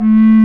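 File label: recorder, woodwinds; RECDR; Roland - LA Composer vol. 1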